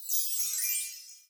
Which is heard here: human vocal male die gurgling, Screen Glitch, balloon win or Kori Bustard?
balloon win